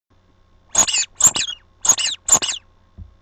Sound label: axe girl